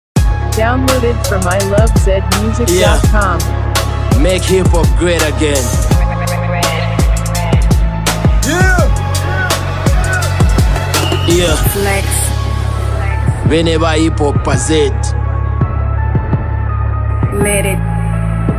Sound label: Make HipHop Great Again FreeStyle